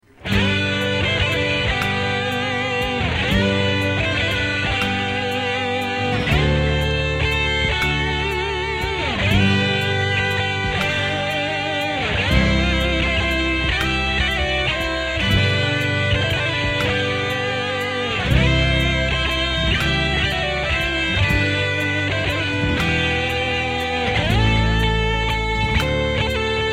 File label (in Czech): LTD F-10 ticha noc